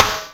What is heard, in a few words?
Ghi Gate Snare, Yamaha DTXpress Acoustic Snare